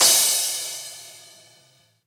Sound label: Crash, Cymbals